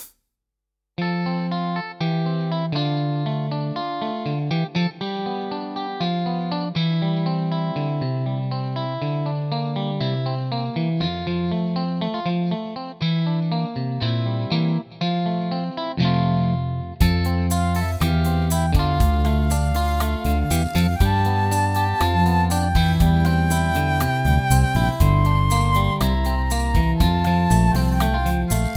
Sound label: integra-7 mix surround